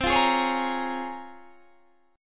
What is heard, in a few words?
C sharp Major 13th, chord